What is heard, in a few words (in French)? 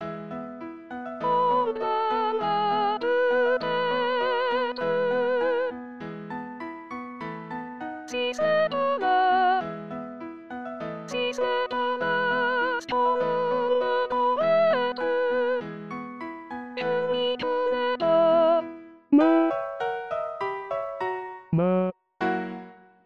Tutti; PLANQUETTE